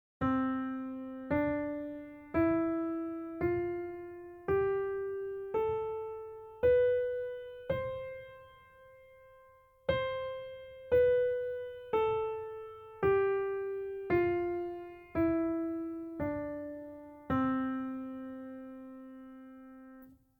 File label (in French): Gamme majeure Do